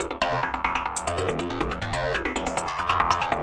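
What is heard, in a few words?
stereo arp, 140 bpm; SYNTH AND ELECTRONIC LOOPS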